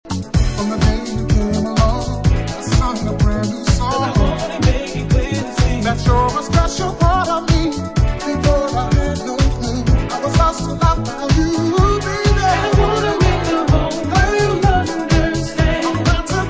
vocal

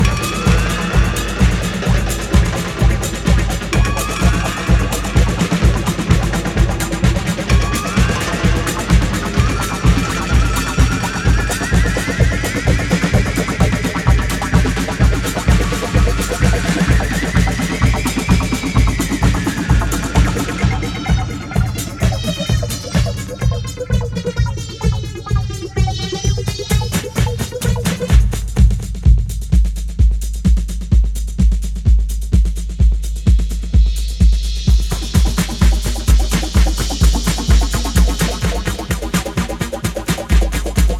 Drum's Implosion; Disco

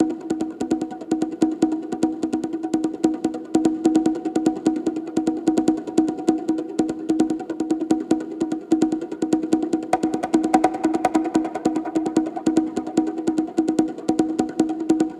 Computer Drumming 148

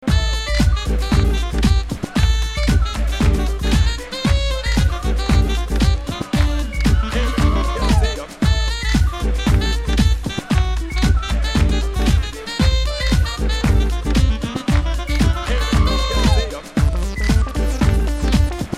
Acid Swing; Swink Music

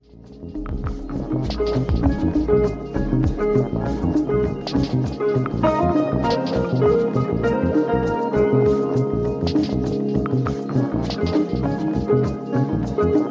Guitar Under Water

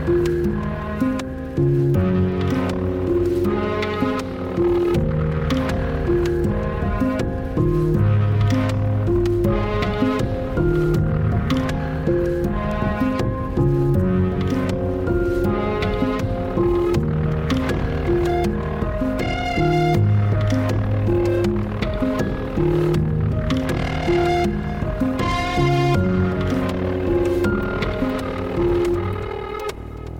Noise Counter Melody

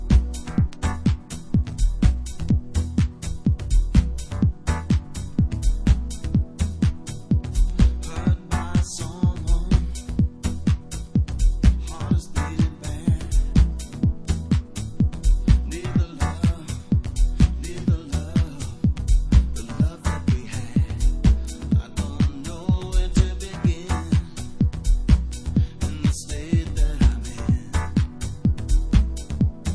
club vocal mix; house

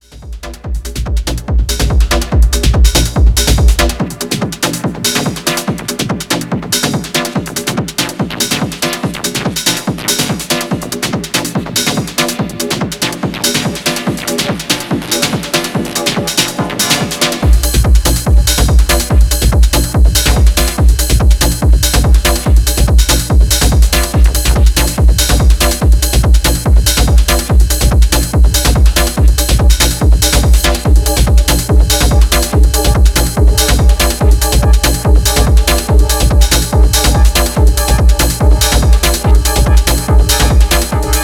Untuned Harmonics

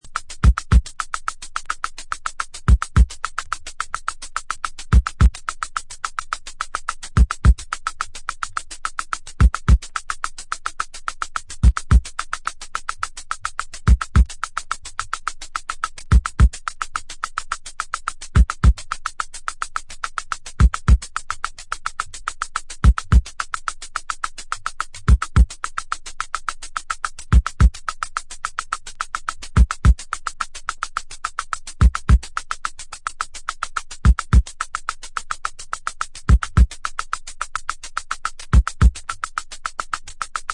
drums

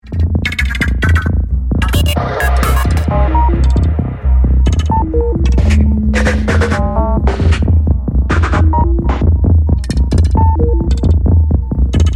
Ambiance

